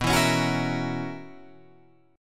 B Major 7th Suspended 2nd Suspended 4th B down Guitar Open F; strummed; JGuitar